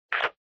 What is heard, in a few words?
Handheld Transceiver Noise Short